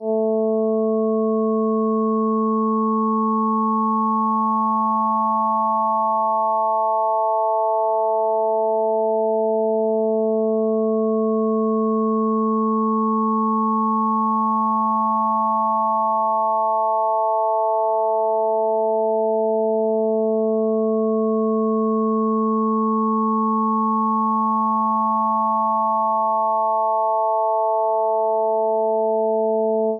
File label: partials lfo